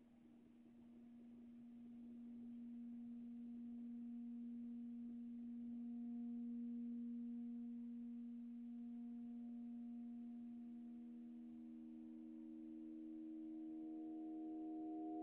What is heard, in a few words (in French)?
medium blanc, aeolian; corde médium en polyester gainé - sheathed polyester medium string; Expérimentations diverses et en cours. Current various experiments